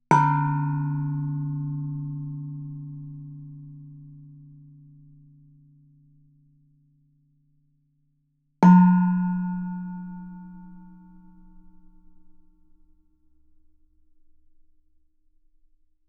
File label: Gamelan; Propeller Island - Complete Gamelan